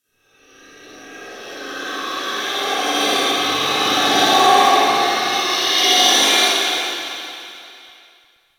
Ice Distant Robotics; Ambience; Warframe; Corpus Ice Planet

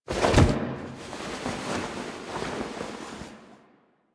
Foley Body Fall; Ostron; Warframe; Quests; The New War; Narmer Outpost; Chamber Archon Intro

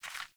Hare Footstep, Foley; Animals; Ambient

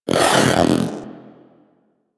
Vocal Attack Melee; Warframe; Pets; Zanuka; Zanuka Companion A

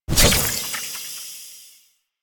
Fishing Spear Throw; Warframe; Weapons; Eidolon Fishing Spears

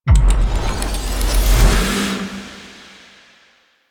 Death Stop; Ambience; Drifter; Duviri; Warframe

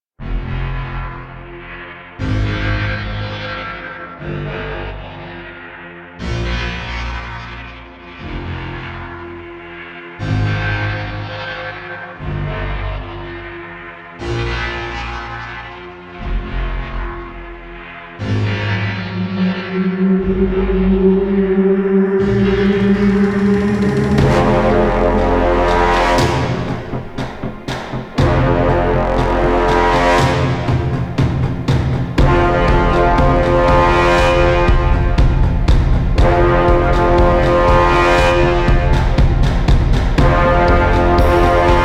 Shrine Intro Music; Warframe; Sacrifice Quest

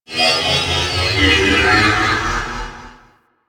Ship Distant Energy Pulse; Ambience; Warframe; Corpus Ship Remaster

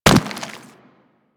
Infested Runner Step Default; Warframe

